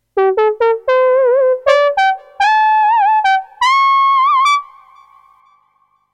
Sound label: Leadsynth